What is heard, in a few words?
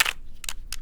Small Cube Twist, Rubik's Cube